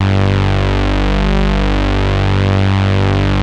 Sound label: MOOG BASS G2; Best Service Dream Experience